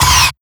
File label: Sweep Hit; Sonic Foundry (Sony Creative Software); Esoterik Beatz; Sweep Kit